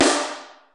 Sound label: snare, Kurzweil K2000